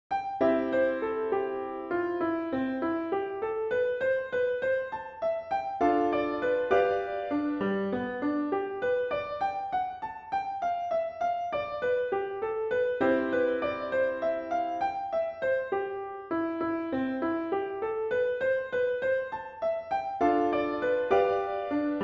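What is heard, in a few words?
jig, melody chords; Simones Jig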